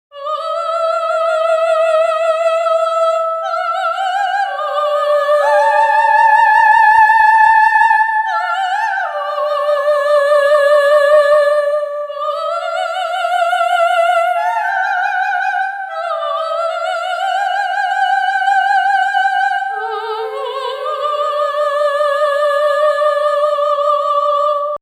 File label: vox